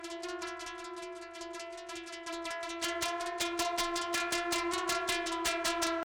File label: Music Gameplay State Tension; Music Hideout4; H4 Level Area=Cave Forge